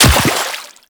Run Water, Footsteps; Characters; Warframe; The New War; Kahl

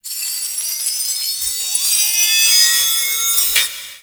Boss Projectile Reflect Charge; Warframe; Cephalon Weave

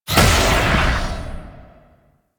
Super Weapon Missile Fire; Warframe; Vehicles; RailJack; Proc Ships; Corpus

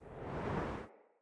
Great Sword Heavy Attack Windup; Weapons; Swords; Dendrit Great Sword

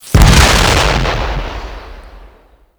Swarm Missile Explosion; Warframe; Swarm Missile Launcher

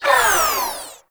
Uzi Tail Wind Down; Warframe; Tenno; Prime Tenno Uzi; Weapons

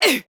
Pain, Female; Warframe; Modular Corpus Female; Battle Barks; en

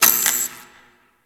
Light Flicker; Ambience; Props; Warframe; Orokin Derelict

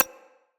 UI Common Hover

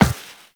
Walk Metal, footsteps; Warframe; Characters; Operator

